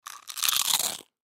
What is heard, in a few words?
Human Bite Food; Bite Food Chip Chomp Loud; Eating; Sound Effects:Human